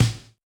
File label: SD Room L, Yamaha DJX 2B